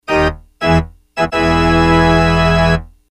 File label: fanfare